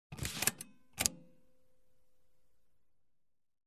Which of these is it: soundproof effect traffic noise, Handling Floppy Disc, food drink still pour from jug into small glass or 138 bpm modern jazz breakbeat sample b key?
Handling Floppy Disc